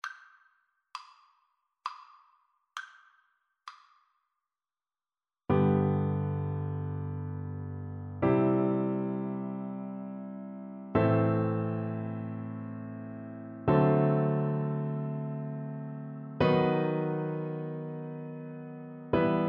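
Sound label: recorder karaoke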